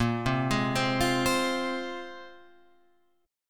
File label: Bb 7th Suspended 2nd Bb down Guitar Open F; Bb7sus2 arpeggiated; JGuitar